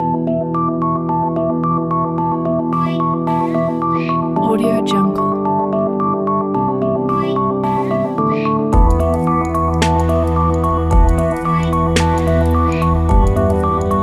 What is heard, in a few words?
ambient minimal